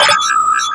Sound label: arcade multiply; user interface; Call of Duty Modern Warfare